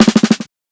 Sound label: fill, drums; BASEMENT DRUMS VOL 2